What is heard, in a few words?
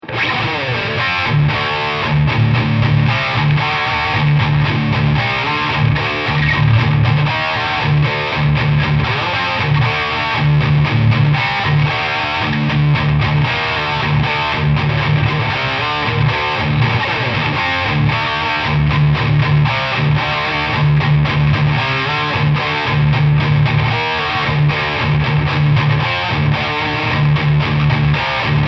Amp 1 Channel A Boost